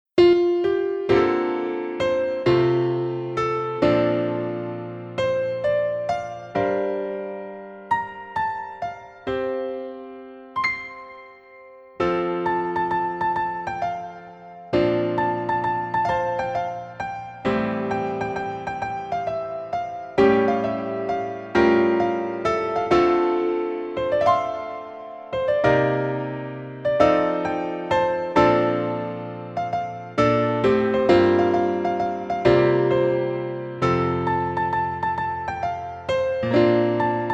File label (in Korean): Piano Ver 멜로디MR 여자키